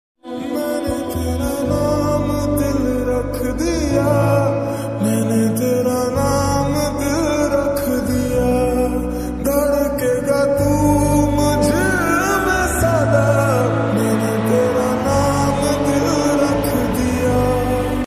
Lofi

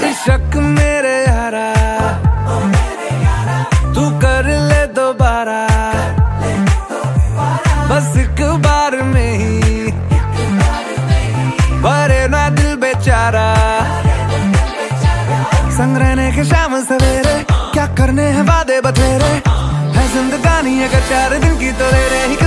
Party song

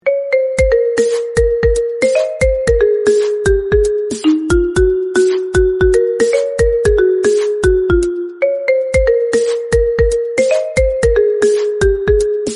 Orchestral Whimsy Retro Ringtone

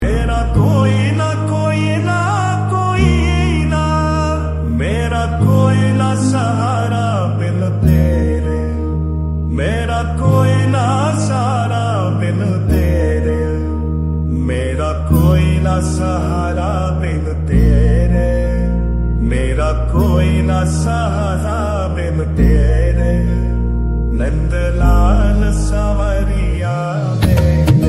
Krishna Bhajan

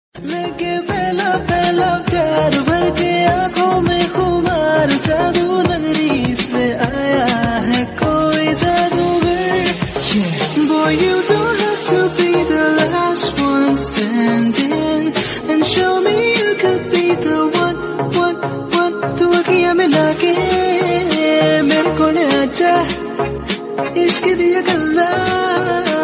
Trending Bollywood Music

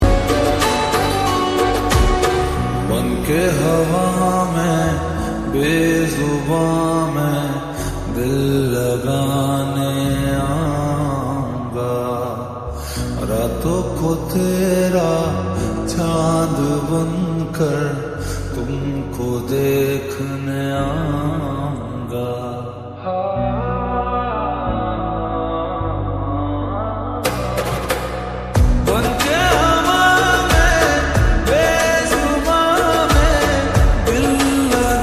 slowed reverb lofi music

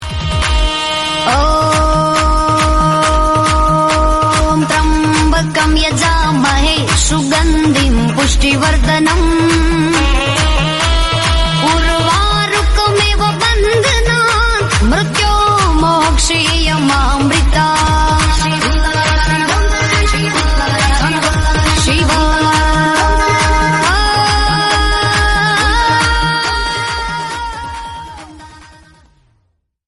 Om Tryambakam Yajamahe

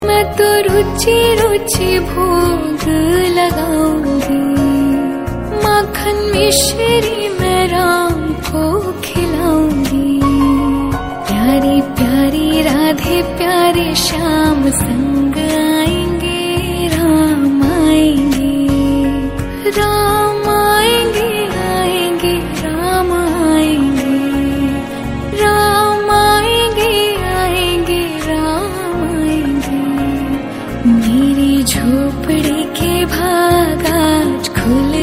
Song

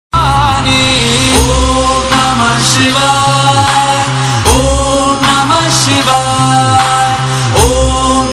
Mahashivratri Special Song

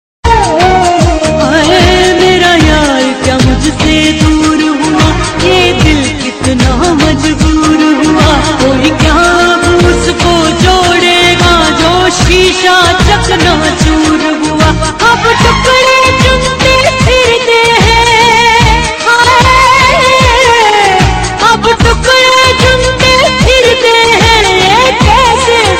female version

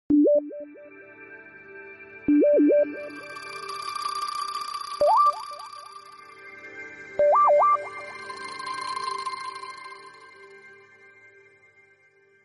Oneplus Alarm Tone

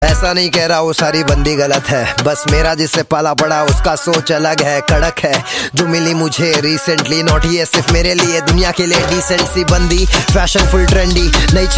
RAP

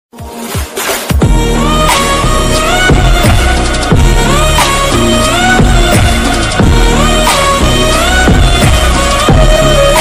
ringtone

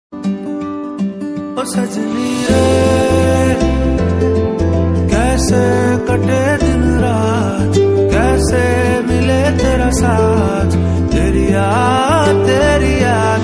New love Song

